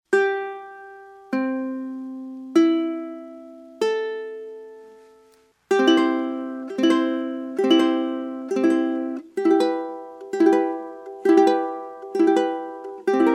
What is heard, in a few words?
ukulele; fukushima